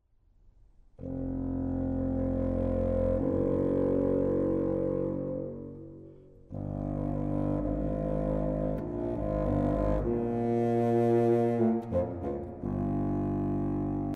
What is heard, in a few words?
Bass Nighingle